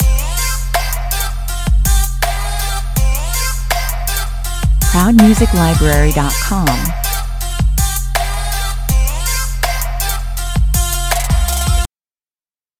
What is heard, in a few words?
trap this (loop)